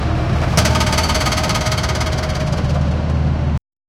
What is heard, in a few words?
light tractor rumbling; apples; SFX Engine